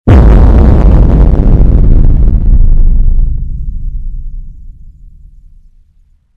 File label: Cinematic Boom